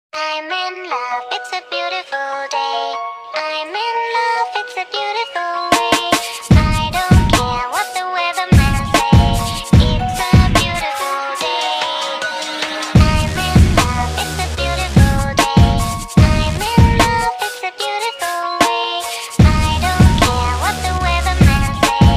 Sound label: BGM ringtone